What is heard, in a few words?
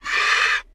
zombie dead; Zombies Night